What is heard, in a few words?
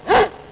dog sit; Pro Android Games; Wolf3D